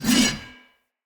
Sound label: Sword Whoosh Heavy; Weapons; Player; Swords; Fenn Jotar Sword